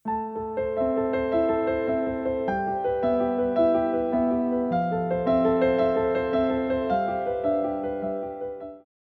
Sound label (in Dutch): Klassiek